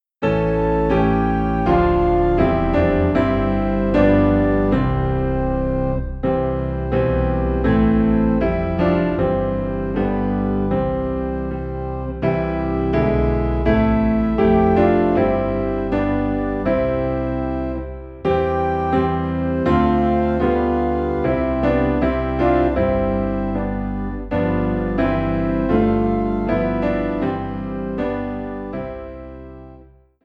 Piano and Organ Low in C; Christ Whose Glory Fills the Skies

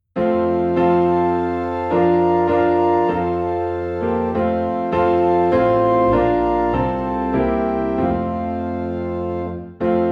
Piano and Organ - High in F; My Jesus I Love Thee

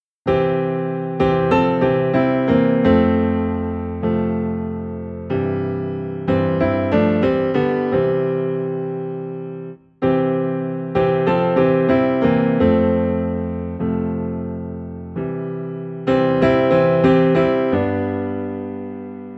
Piano - Low in C; What a Friend We Have in Jesus

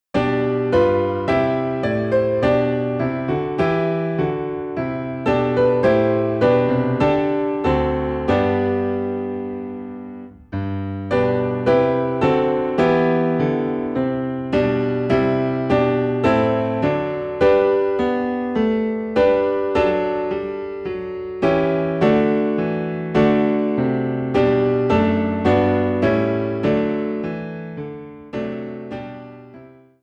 Piano in G

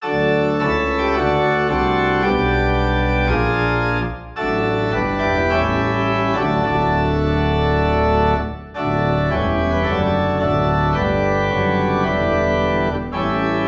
Organ Low in D; By the Sea of Crystal

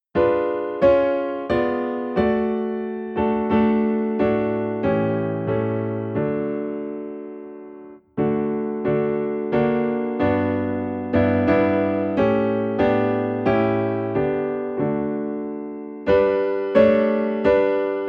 Great Is Thy Faithfulness Piano High in D

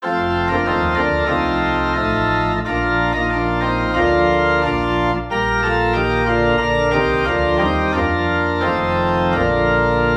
Organ Low in B Flat; christmas; O Thou Joyful O Thou Wonderful

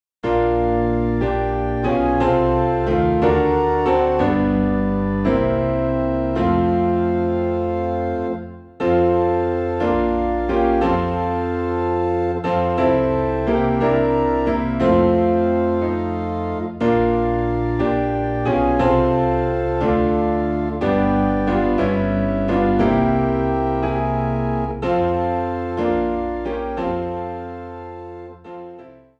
Piano and Organ in F

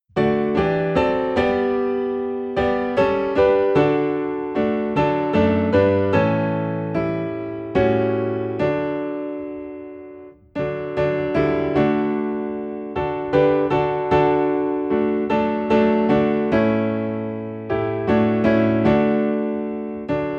Piano in D; The Love of God

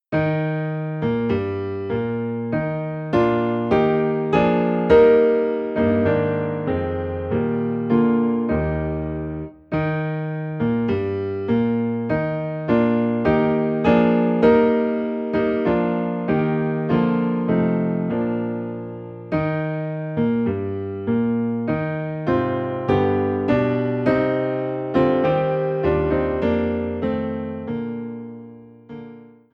Piano Low in E Flat

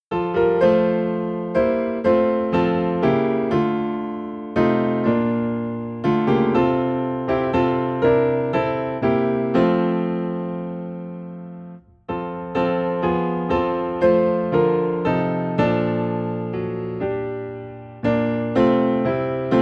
Piano in F; Turn Your Eyes Upoin Jesus

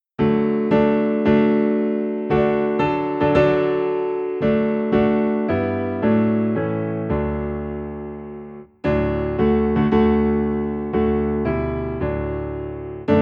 Piano - Low in D; Victory In Jesus

